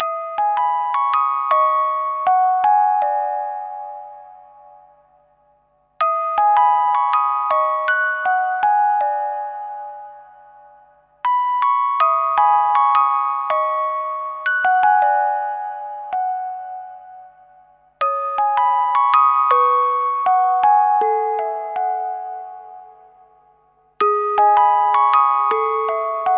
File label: Music Box; Yaminabe Aries Asu he no Chousenjou